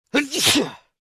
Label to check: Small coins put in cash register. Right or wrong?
Wrong